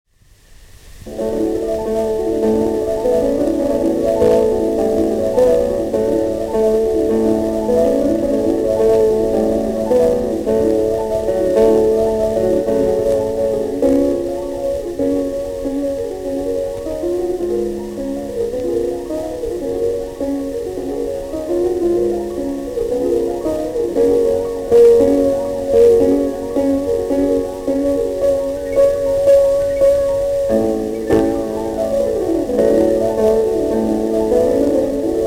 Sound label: Grande Valse de Concert in D-Flat Major